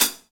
Pop Closed HiHat

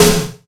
SP1200 AMB TO; SP-12+1200; Greytsounds VOL-1

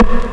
PERCWOOD, PERCUS KIT

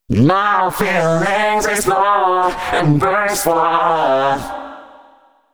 female c#, Dance Ultra Voice FX; Mutekki Media

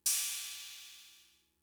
Cymbal, Fricke MFB-522, Drum Machines

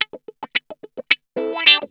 SCRAPE, Funk Guitar; Zero G - Funk Guitar

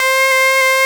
BRUTE C3, Analogon

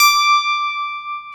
PIA DX E-PIANOS

